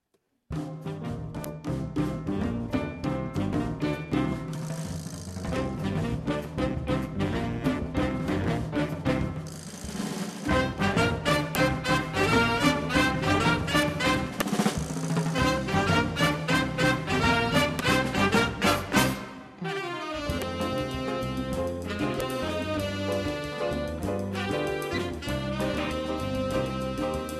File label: Salsa